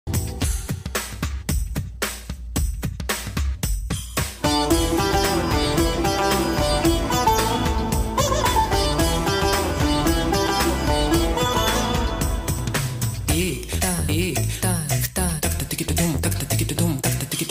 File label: veena bit; kamilini; telugu